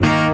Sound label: orchestra string hit D